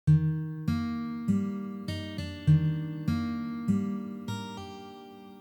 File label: Ornamentation Pull Off combine Slow